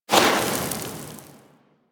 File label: Sword Elemental Fire Whoosh; Warframe; Teshin; Weapons